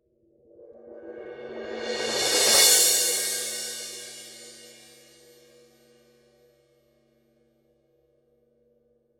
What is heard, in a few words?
18 thin crash roll, paiste; pst 7